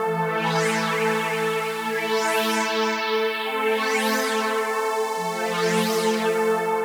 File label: Pad